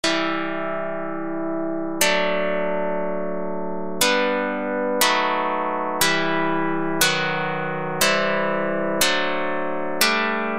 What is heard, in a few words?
pluck